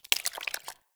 Sap Drip Fall; Ambience; Props; Neathuns Root Cave

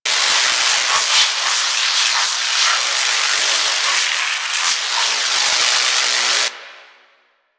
Tesla Ball Attach Zap Loop; Warframe; Trapper

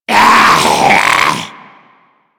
Zombie Attack, Vocals; Mariner Zombie

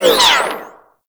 Corpus Energy Projectile By

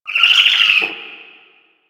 Owl Idle Chirp; Warframe; Duviri; Glyph Puzzle

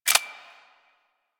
Glass Shotgun Fire Stop; Warframe; Tenno; Weapons

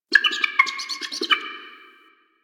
Owl Critters Vocal Shake; Warframe; Ambience; Duviri

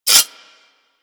Whip Mech Rotate; Grineer

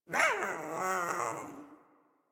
Pet Speak; Warframe; Catbrow; Pet Ship

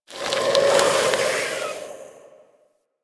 Infested Pistol, Weapons; Warframe

